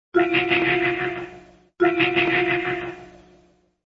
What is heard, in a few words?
sword swings; OST Убить Билла